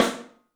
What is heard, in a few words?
MARCH, 3D Drums; 3D Sound; MARCH XTREM